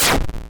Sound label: die; webglplatformer